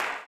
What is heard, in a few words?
CLAP, blues & soul; ILIO; Vocal Planet